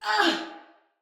Pain, Male, en; Battle; Barks; Dialog; Sinecure Banneret

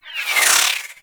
Projectiles Whizzby, weapons; Broken Frame Warfan

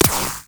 Shield Impact

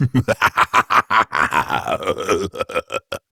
Laugh; Warframe; Tyl Regor Boss; Efforts

